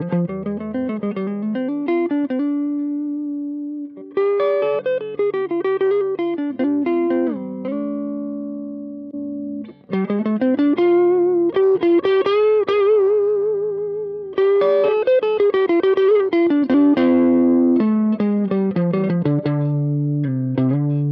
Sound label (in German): Fender Squeeze Kompressor Treble Boost; Compadre